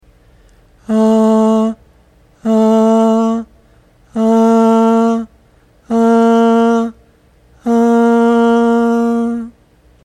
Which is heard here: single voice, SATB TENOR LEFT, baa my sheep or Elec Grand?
single voice